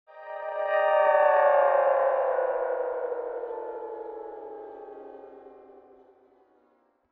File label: ambient